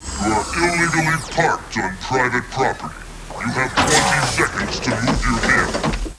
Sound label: Hit